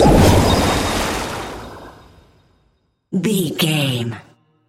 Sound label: water magic blast